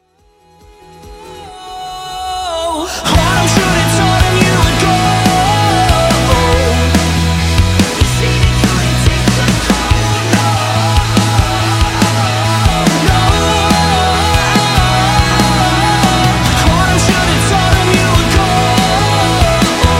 bass rock example

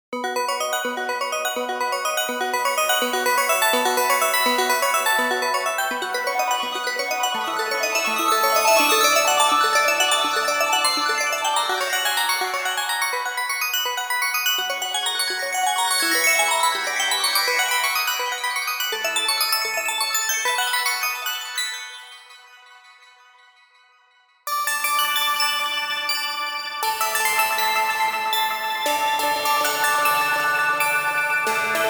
bells

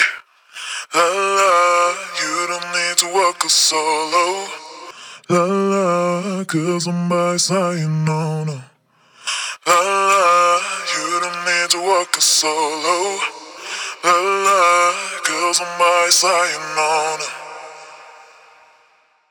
low pitched vox dance vocal

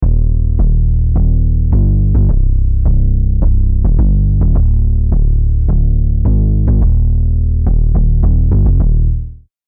serum basses; chiqui audio

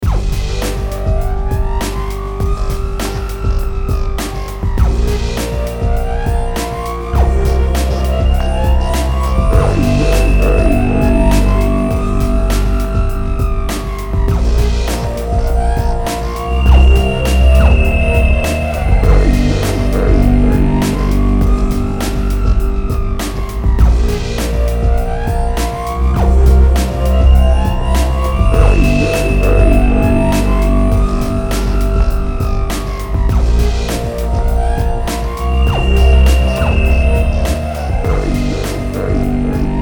all sounds from polychr

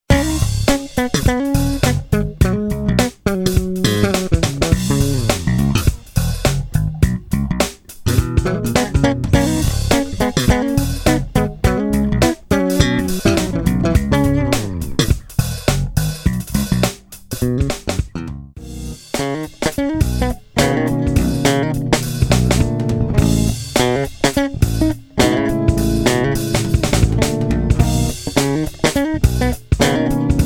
bass

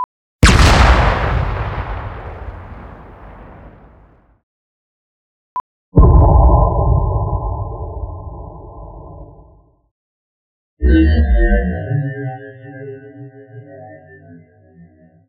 lazer explosion

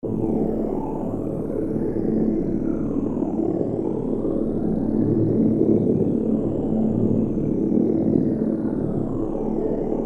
vocal pad

